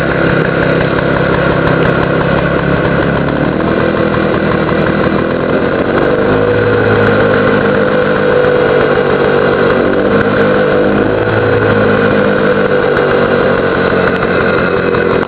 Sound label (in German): Standgeraeusch